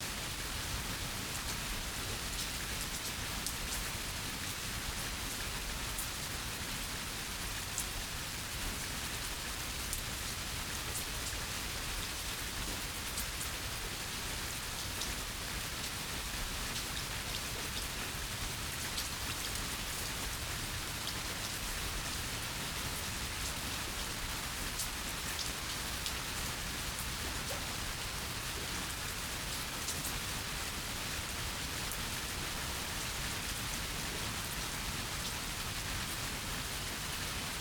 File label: Gutter Rains